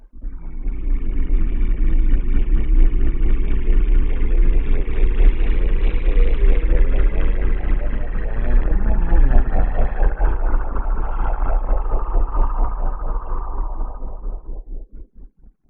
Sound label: weird slow